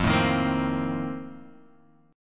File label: A sharp Major 7th Suspended 2nd Suspended 4th